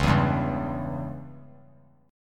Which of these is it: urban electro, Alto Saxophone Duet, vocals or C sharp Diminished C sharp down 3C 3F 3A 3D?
C sharp Diminished C sharp down 3C 3F 3A 3D